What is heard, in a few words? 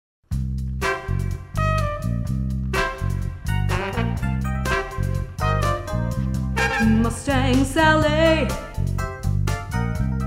vocal